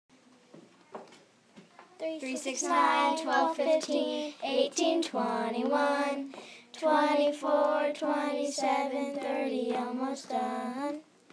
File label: count by 3s song